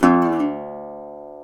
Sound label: GTR, Dobros, National